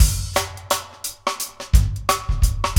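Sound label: 84 bpm, Funky Drums; AMG; Now CD-ROM (Roland); Neil Conti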